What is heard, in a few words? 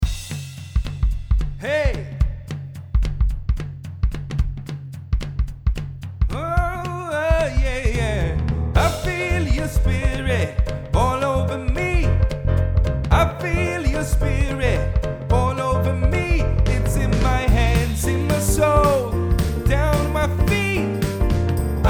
i feel your spirit sop